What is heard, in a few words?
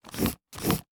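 handwriting wax crayon; soundbits-soundfx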